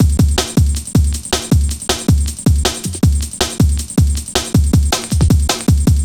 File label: Drum Loops, 158.5; Jungle